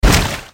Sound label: Water shoot; Blockman Go; Android